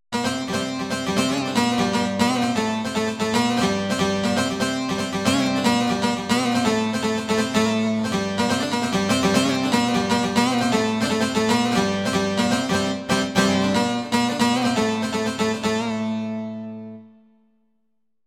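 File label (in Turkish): bağlama, eşikaltı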